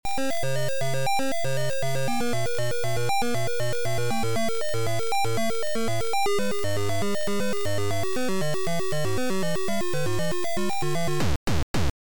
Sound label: Title Beeper; Alien Evolution